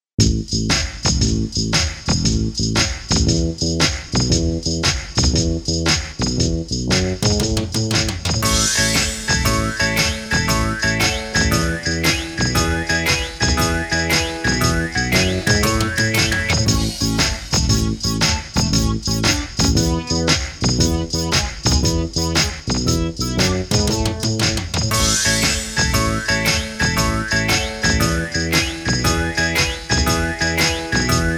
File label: alphabet song karaoke